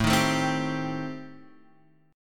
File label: A Minor 7th Flat 5th A down, Am7b5 chord; strummed; JGuitar